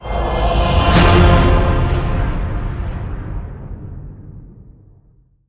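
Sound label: Hit Orchestral Cave Men F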